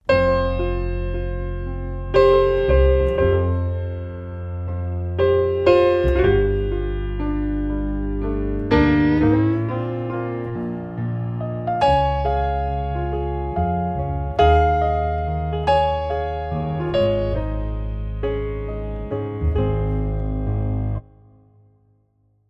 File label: Classic BoomBap